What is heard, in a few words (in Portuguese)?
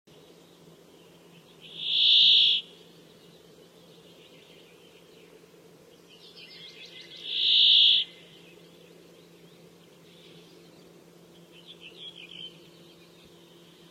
canto do tentilhao montes